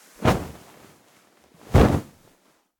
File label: Blanket Lift; Open & Close